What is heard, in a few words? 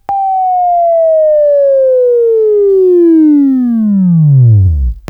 WAV Left, Sweeping; Labview Program; Sweeping Training program